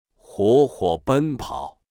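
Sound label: chinese man singing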